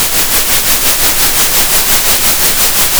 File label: center, Sound of a train